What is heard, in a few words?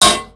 tank hit small interior